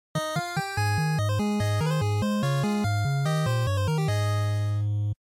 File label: NES General Clear1